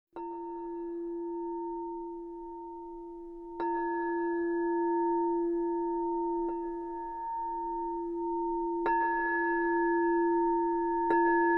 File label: cry bowl